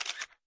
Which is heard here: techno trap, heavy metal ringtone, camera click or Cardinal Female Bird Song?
camera click